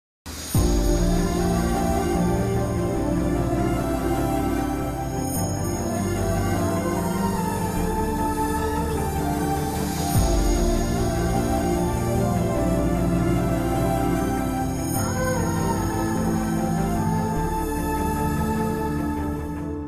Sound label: emotional bgm; ooru peru bhairava kona; sundeep kishan varsha bolamm